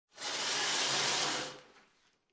Shower Curtain Close, Household, Shower Curtain Close, Metal Shower Curtain Holders Sliding On Metal Shower Pole; Bathroom